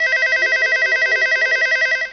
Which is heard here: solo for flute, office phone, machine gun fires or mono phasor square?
office phone